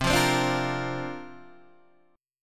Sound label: C 7th Suspended 2nd Suspended 4th, Guitar, Open D